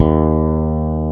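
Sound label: fretless bass D2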